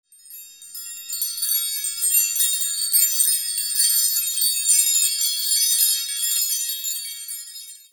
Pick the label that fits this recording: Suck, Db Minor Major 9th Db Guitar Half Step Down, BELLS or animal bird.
BELLS